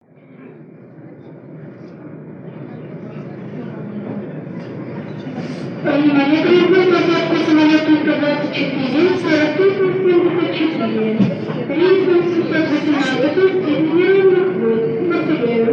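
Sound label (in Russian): sound of an airport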